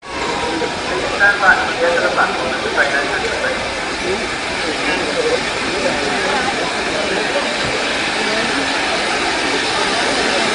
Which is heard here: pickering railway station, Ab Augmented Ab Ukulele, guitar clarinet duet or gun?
pickering railway station